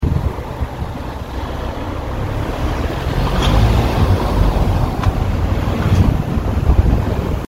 Mazda 6 engine knocking problem while accelerating